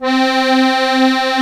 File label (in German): DIATONISCH, AKKORDEON, Alpine Volksmusik; Best Service